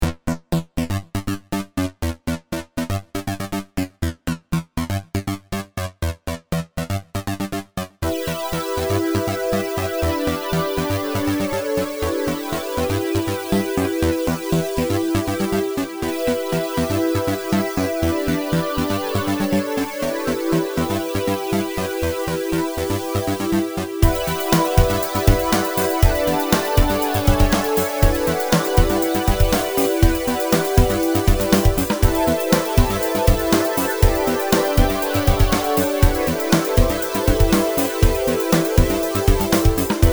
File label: Ultimate 80s (With Drums)